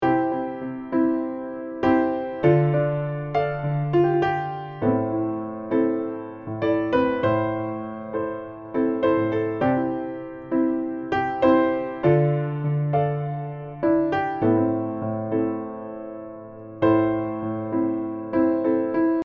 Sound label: Piano Dry